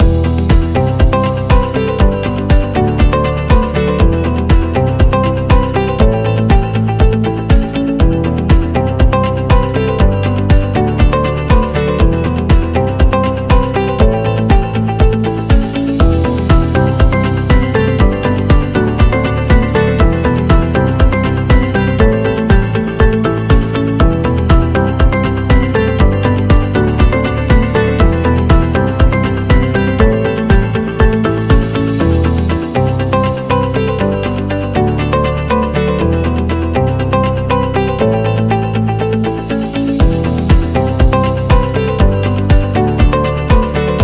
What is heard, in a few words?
The Corporate Loop Background; technology